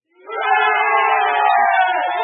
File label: yay; epaath; grade5; english; describing people; game